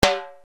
RX7 TIMBL HI, Yamaha RX-7

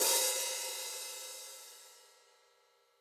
Acousti Hat Hit, hihat

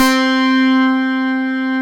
FACE BASS C5, TRACE BASS, Synth

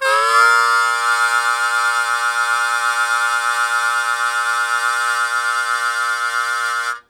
Blues Chord Attk Bb1, Harmonica; Blues Chord Attack; Zero G - Harmonica

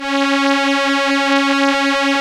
STR ELKA, Elka Strings, Elka Violins; Roland LCDP09 Keys of the 60s and 70s 1

